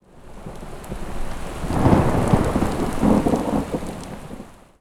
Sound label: thunder